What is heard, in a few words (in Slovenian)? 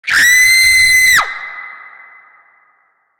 KRIK ZENSKI; Efekti